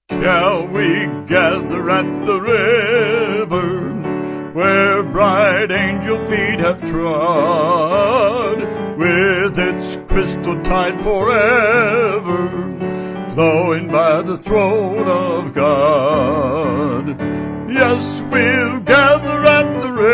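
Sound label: Vocals Piano, church music backing; Shall We Gather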